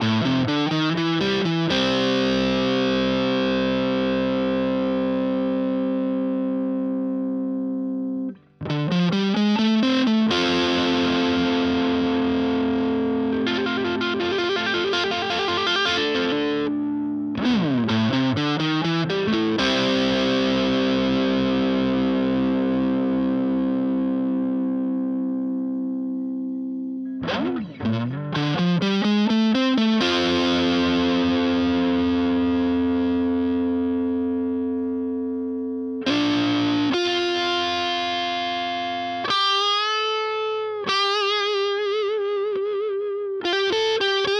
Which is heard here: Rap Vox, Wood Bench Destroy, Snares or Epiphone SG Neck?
Epiphone SG Neck